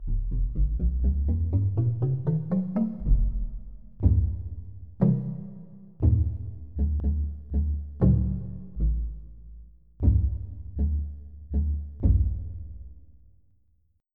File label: Log Drum